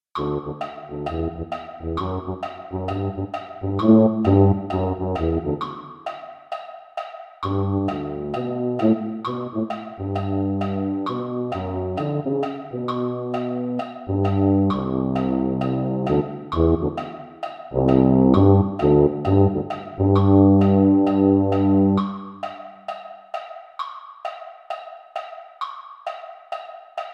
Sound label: tuba; concert corps